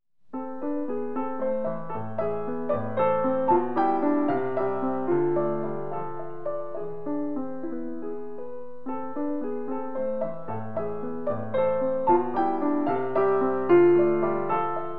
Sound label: Waltz